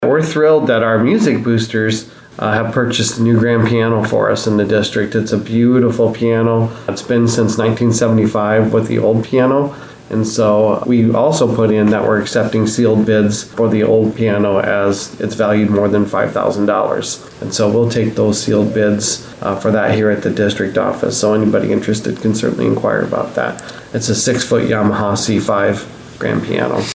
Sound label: grand piano; Jim Murray